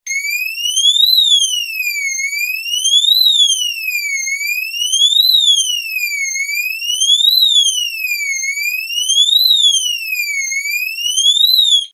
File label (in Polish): ton MIDI